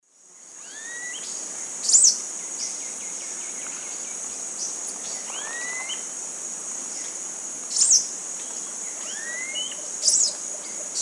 Dacnis flaviventer, calls; S. America; Eastern Lowlands

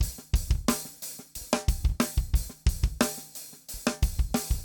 funk 90; odd loops

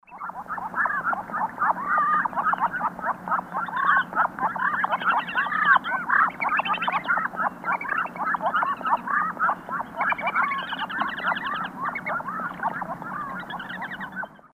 Cairina moschata, calls flock; S. America; Eastern Lowlands

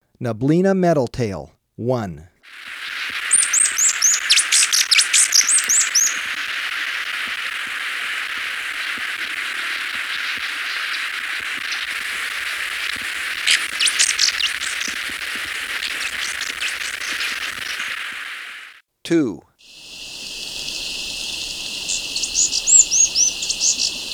Neblina Metaltail; S. America

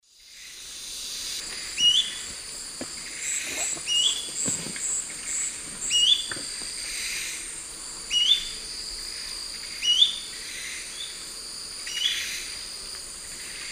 Tolmomyias poliocephalus, call; S. America; Eastern Lowlands

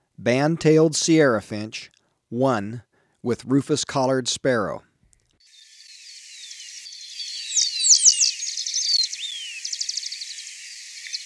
Band-Tailed Sierra-Finch, Birds of Ecuador; S. America

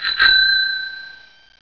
BIKE BELL

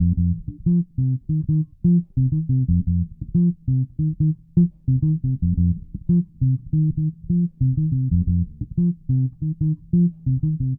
Bass Hip-Hop

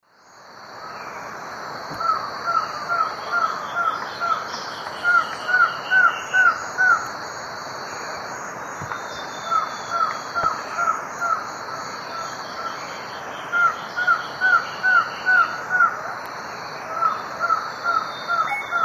Thamnophilus aethiops, duet; S. America; Eastern Lowlands

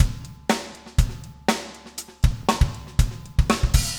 funk 120 bpm, loops

